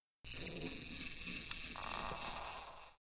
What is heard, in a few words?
Display Servo Hums C; servos